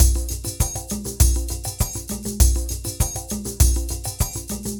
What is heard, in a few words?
Ensemble, Latin Percussion